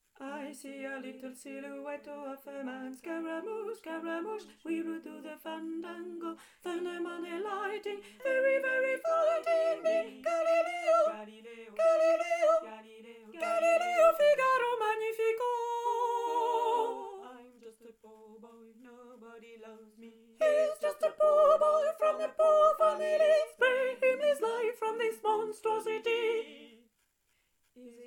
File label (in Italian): soprani